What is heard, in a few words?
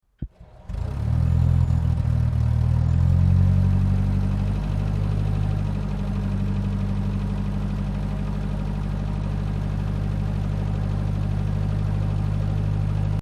TANK CENTURION SECONDARY ENGINE IGNITION, British Centurian main battle tank; Trucks (Sound Effects:Vehicles); SoundEffects-Foundation4